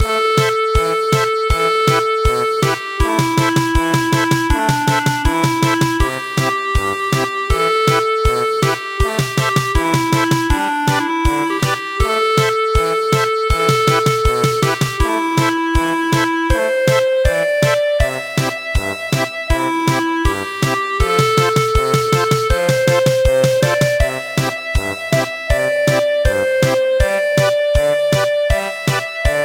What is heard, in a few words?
German Polka; midi; traditional